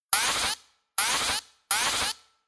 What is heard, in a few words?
Future Telemetry Static Beeps Buzz Bounce Button Interface